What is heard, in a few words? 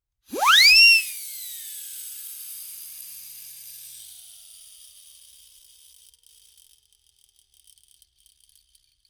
EFX INT Siren Whistle, Slapstick Comedy; Coll Anderson